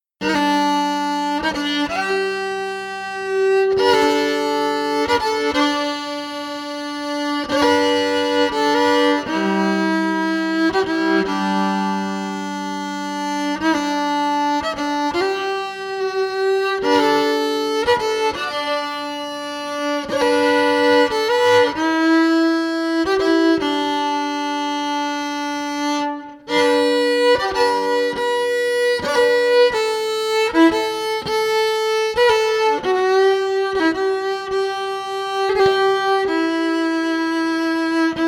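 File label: Electric Fiddle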